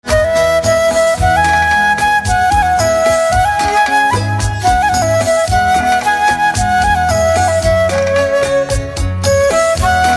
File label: Flute Instrumental Ringtone